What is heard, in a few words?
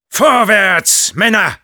battlecry, de; "Vorwärts, Männer!"; Sniper